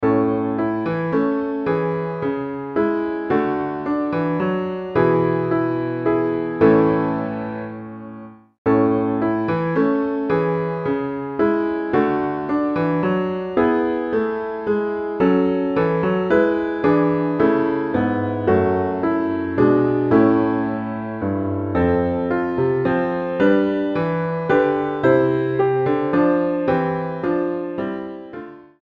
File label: accomp; Take Eat